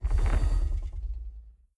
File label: armor; smite; tiamat